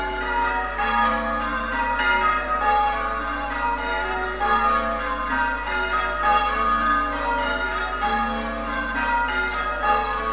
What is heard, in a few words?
bells